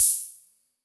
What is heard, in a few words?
hat synth; Sonic Foundry (Sony Creative Software); Ugly Remnants VOL-2; Beatstuff